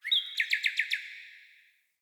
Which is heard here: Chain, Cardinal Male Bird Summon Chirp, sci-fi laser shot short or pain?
Cardinal Male Bird Summon Chirp